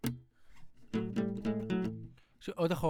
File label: Classic Guitar